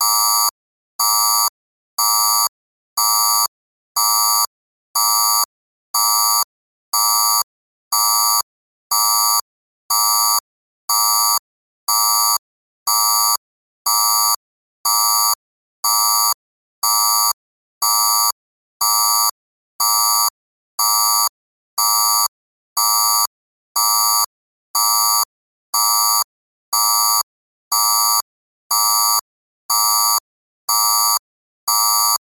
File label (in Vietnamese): Am thanh canh bao tieng bip lien tuc